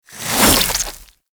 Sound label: Boomerang Whoosh; Infested